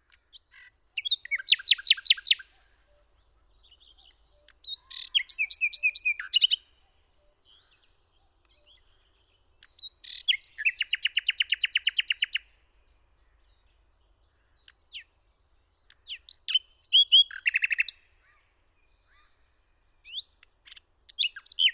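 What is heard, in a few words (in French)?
Rossignol philomele, les oiseaux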